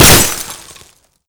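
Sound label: bullet impact auto glass; Modern Warfare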